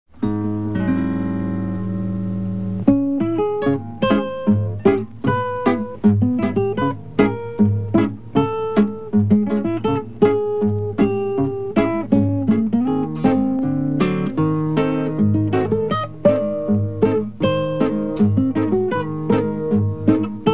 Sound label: akkusticheskiy variant, guitar